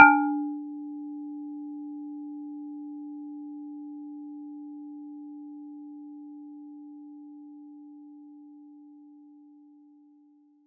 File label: DX7 Strike; knights try